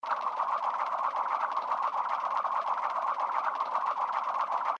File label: Spin, slots; Majhong Royale